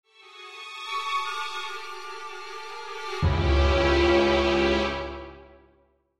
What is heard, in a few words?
Orchestral Accent, Orchestral Accent Dissonant; Orchestral Sound Effects; Blastwave